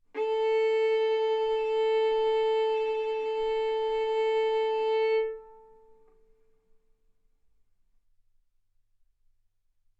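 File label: Violin arco mf A string A4 B4 stereo; sul A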